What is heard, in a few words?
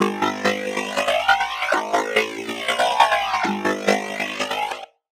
Lead, Dubstep and Trap